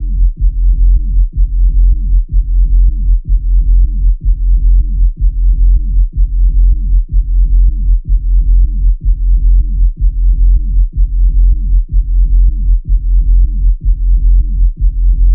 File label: 125 Bass Loop